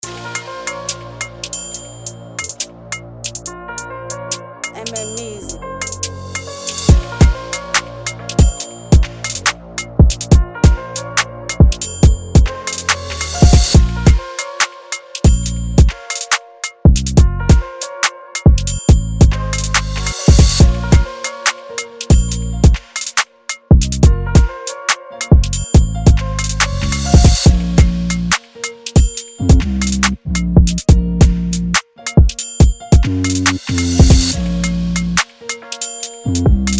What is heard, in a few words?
drill, uk motivation drill beat